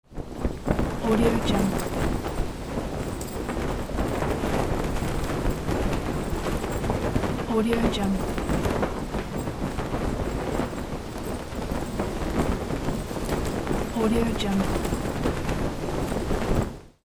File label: flag